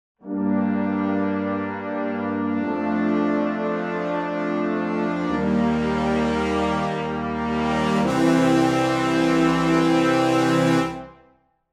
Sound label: Brass Full Ensemble Sustained